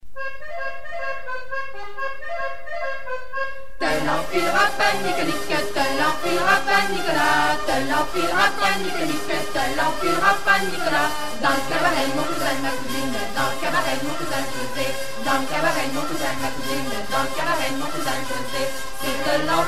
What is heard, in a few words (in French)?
Branle du Balai, France